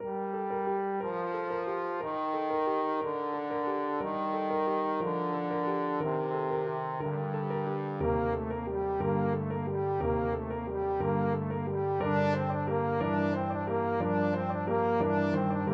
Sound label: trombone